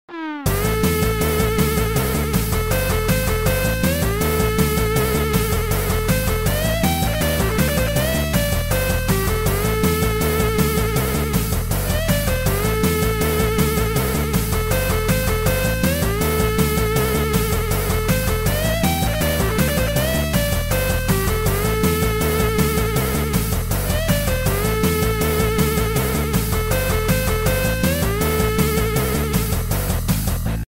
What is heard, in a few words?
Very Good